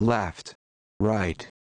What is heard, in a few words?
stereo test